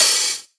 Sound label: Snare Alt Trap Foot